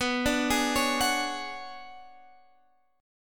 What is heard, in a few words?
B Minor 6th Add 9th B down Guitar Half Step Down; Bm6add9